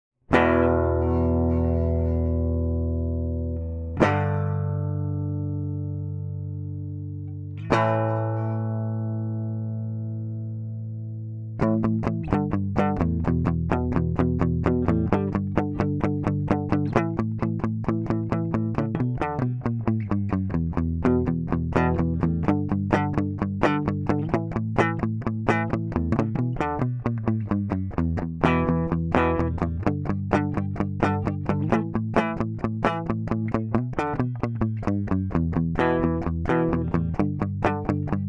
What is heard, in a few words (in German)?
mercuriall spark rhythm 1 ohne plugin